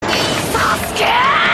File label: alarm